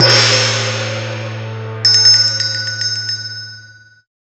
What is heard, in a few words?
Gong Medium mix clochette